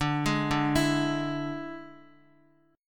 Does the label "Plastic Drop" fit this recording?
No